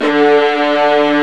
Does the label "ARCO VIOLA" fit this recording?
Yes